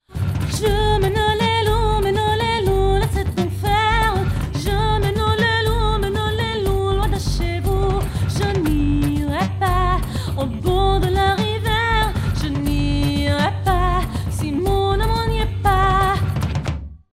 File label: French pop